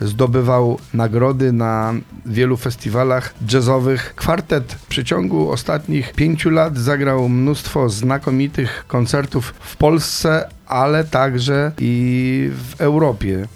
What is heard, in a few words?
jazz